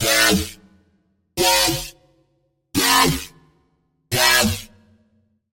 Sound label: Wobble; Manipulation; Routed Sound; Serum 2 Expansion - Chaos Bringer Vol.1